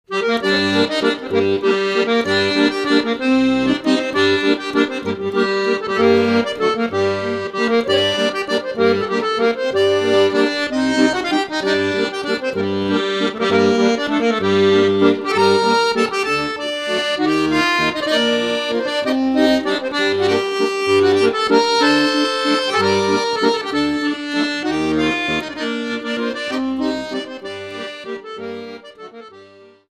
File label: Flatbush Waltz